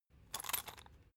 salt mill metallic slow; gastronomie; soundpool

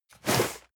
cardboard box medium slide hard; objekte

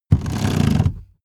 car seat slide backward fast rattle

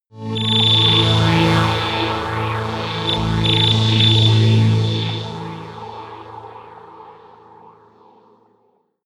sci-fi new galaxy, drones

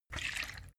bottle vodka upend; berufe

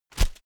whoosh shredded paper hard; swooshes-swishes

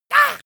monster assault high; schreie

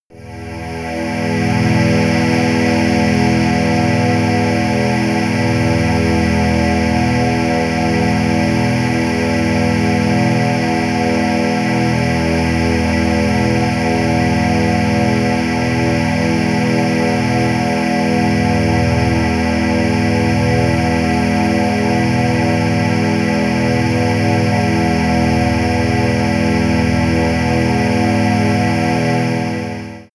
piped air, music elements

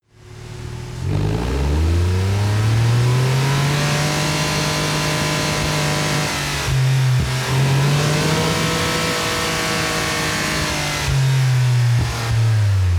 sports car ext engine very high rpm unstable

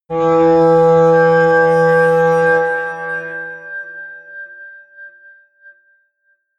atmospheric feedback synthesizer medium, drones

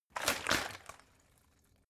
bottle water big shake single; berufe

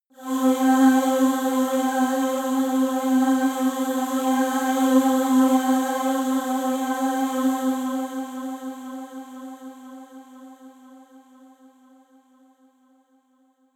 airy choir; toene

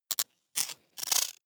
metallic rattle click, robot; comedy cartoon

